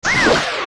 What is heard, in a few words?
torch slug hit; OOT